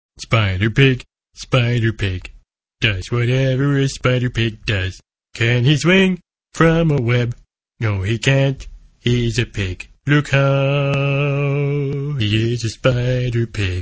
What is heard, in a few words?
Spider Pig Song; Homer